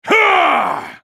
ultimate effort; Garen; Character Voices - English

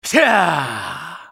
spellcast effort; BlindMonk

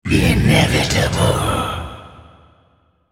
attack; Malzahar

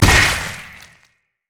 basic attack oh; LoL; vladimir

